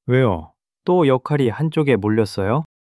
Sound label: surprise